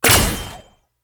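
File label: gunlance, impact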